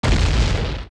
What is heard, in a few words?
Boulder Fall; zelda; OOT